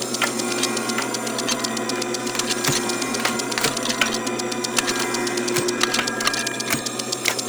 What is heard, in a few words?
Scrap Container Dome Loop; Warframe; Missions; Zariman; Zariman Assassinate